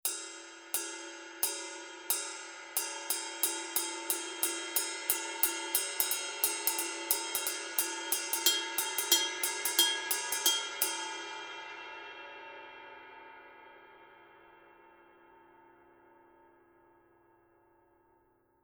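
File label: signature 22 dry heavy ride pattern, cymbales; Forgées à partir d’un alliage secret de bronze; paiste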